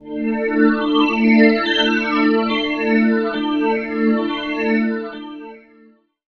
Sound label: Ambi Pad; Zero-G Jungle Warfare 1, 2 & 3; 90 s Sample CDs